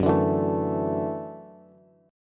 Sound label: F sharp Minor 7th Sharp 5th, Bass; strummed; 14,17,14,14